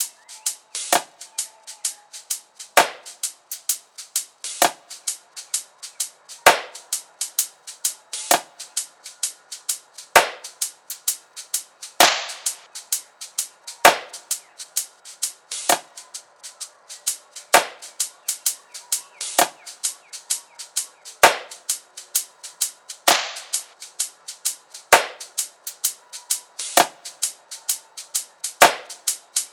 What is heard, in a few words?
tops 65, Drum Sample Pack Loops Top Loops; SD SEISMIC; Slate